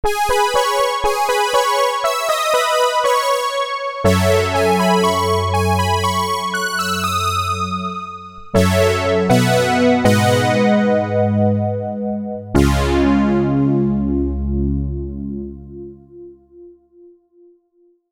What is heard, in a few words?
DSS C8 full range